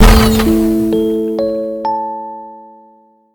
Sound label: game over; tetrio